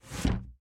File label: Cupboard Slide Out; Lethal Company